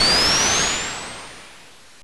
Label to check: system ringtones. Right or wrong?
Wrong